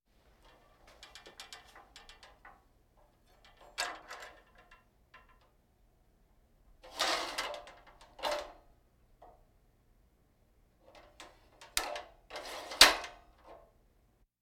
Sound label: Hospital effects